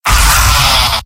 pain severe; demoman; source engine; tf; mvm

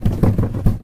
cardboard box shake; physics; Source Engine; cstrike

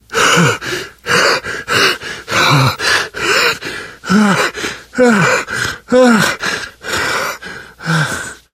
exertion critical, survivor voice

